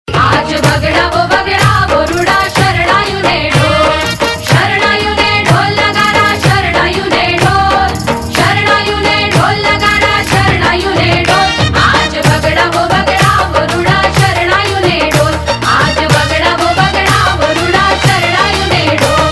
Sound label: Punjabi Gujarati Sagai Song, Gujarati Ringtone; Bollywood; Patel Ki Punjabi Shaadi